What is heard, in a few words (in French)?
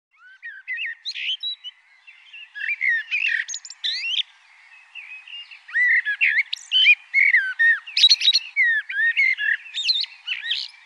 Merle noir; Le cri du merle noir. Au printemps, le mâle chante d'un toit pour attirer les femelles.